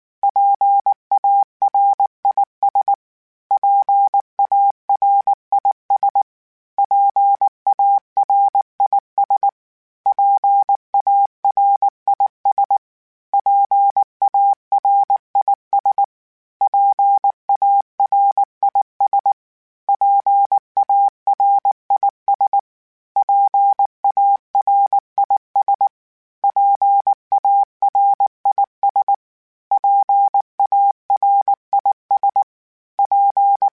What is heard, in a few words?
PARIS, Morse Code; HamRadio; PARIS Calibration